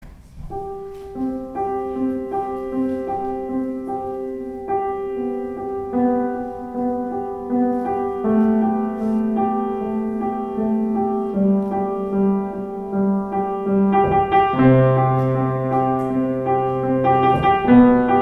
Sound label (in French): Piano